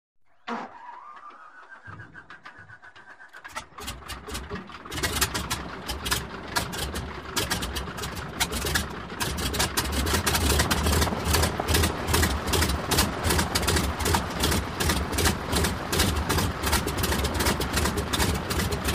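Motor Sputters To Life. Idle With Popping Of Pistons, Whirring Of Belts, And Starting Prop Movement. Revs Up To Higher Idle, Propellers, Prop Plane; Broussard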